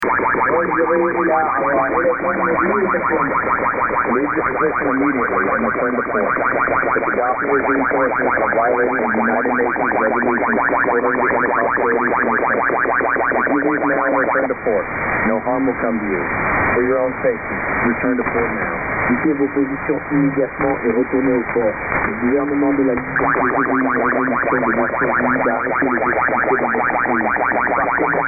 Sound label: psy ops jammed